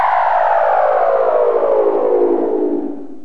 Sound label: GHOST, creepy sounds